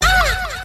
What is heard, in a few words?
ah